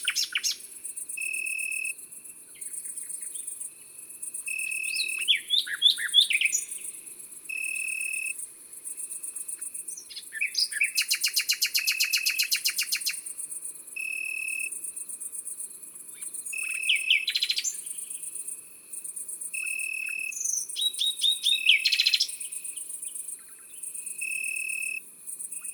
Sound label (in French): Grande sauterelle verte, Concerts Naturels de la Drome; Studio les Trois Becs